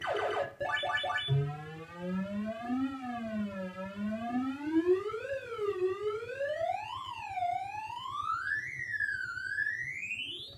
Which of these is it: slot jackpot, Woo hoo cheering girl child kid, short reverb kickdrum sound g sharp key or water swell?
slot jackpot